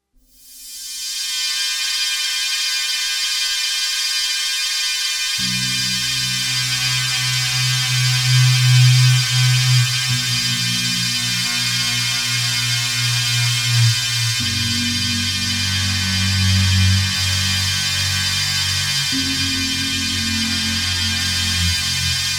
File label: WAIL